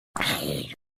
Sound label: Zombie Death; Minecraft